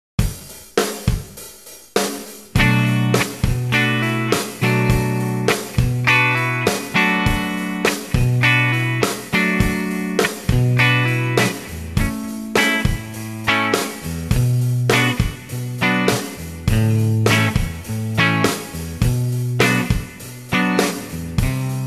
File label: Rhythm Guitar